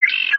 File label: Bird Call; creatures organic; Monument Bird; aronwk collection; ndaudio